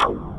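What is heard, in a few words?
hollow percussion; Pro-Rec; Synthsonic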